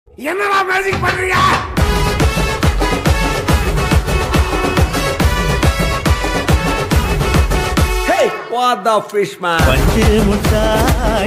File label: remix song; mark antony